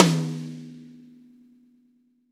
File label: V-Pop Tom 50, Roland BK-5 Toms